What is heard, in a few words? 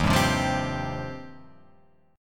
Eb 6th Add 9th, Guitar, Open F; strummed; 3,2,0,0,3,2